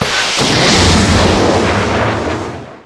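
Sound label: Booster Interior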